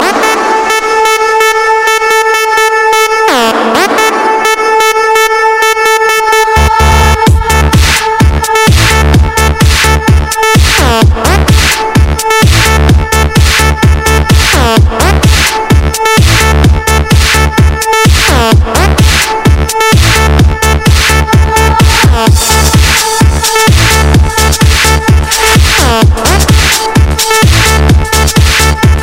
effect bass

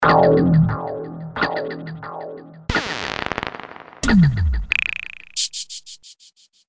sound sms

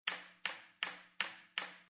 funny stick

